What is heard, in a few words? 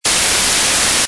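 White Noise Stereo MP3Pro; 96K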